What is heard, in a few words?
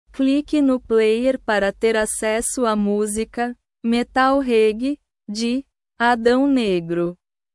metal reggae